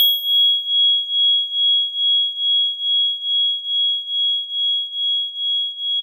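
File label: Jazzy Organ DX100 G#7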